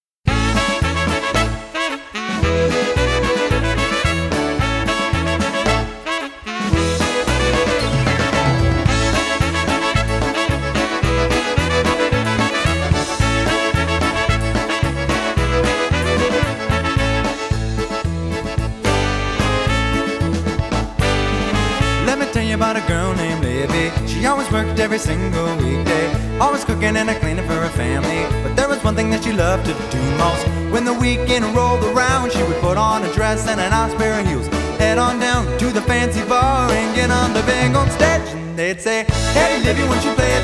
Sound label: Polka